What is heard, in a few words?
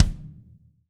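bass drum, Drum 1 Dry; East Collexion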